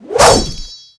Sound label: Attack